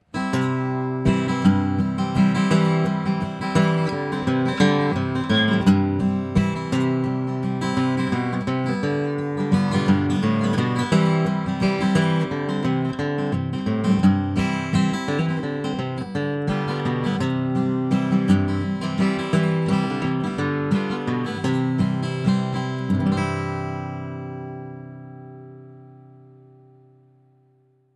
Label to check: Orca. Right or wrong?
Wrong